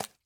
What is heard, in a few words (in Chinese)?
青蛙脚步声